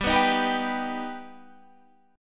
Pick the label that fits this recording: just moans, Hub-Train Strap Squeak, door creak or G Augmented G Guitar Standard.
G Augmented G Guitar Standard